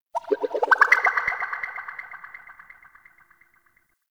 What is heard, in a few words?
Ocarina; Warframe; Ambience; Nokko Colony